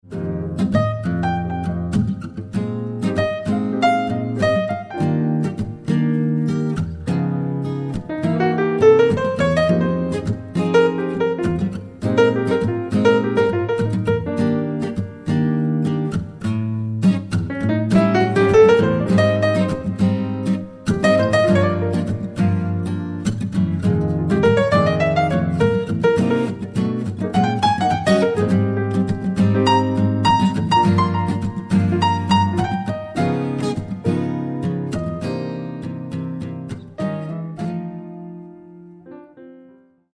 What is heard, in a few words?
duo git piano